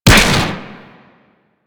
Fire Shot, Rifles; Snipetron Vandal

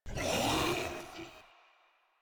Infested Ancient Vocal Pain; Warframe; Proto Infested

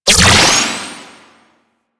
Door Laser Activate; Corpus; Warframe

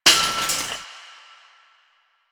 Shopping Cart Hit; Warframe; Nineteen Ninety Nine

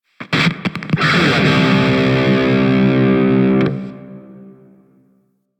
Grineer Shawzin Equip; DE; Warframe

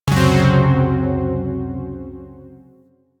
Shawzin Hirajoshi Chord; Zariman Shawzin

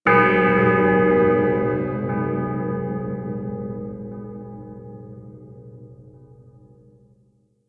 Amphitheatre Bell C; Warframe; Duviri

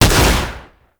Infested Shotgun Shot; Weapons

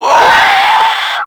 Infested Crawler Attack Short; Warframe

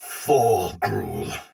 Attack Heavy Soldier Sword Agent Male; Dialog; Barks; Battle; Melee; Sinecure

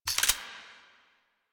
Refill Clip; Warframe; Duviri; Boon Powers

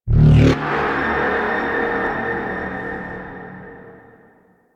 Space Fighter Coil Agent Destroy; Warframe; Enemy Ships; Space Fighter Sentient Coil Agent

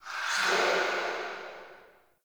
Under City Cavernous Water Lap Rock Distant

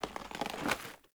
Day Branch Crack Small; Ambience; One Offs; Glades Of Andurin

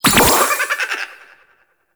Pickup; Warframe; Nokko Colony; Find Nokkos

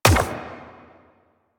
Hand Bomber Proj By Bounce; Warframe; Man In The Wall

